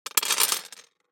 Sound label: DICE on Glass, Throw and Roll, Standard, 16 Sixteen Dice; Samples; ArticulatedSounds; DICE Sfx Party (Extended)